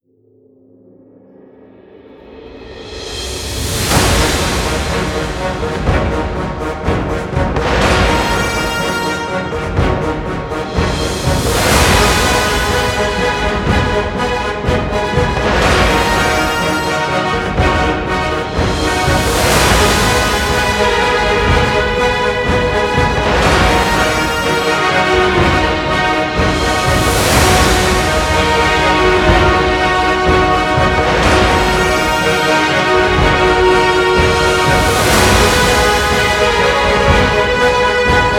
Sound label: epic game intro trailer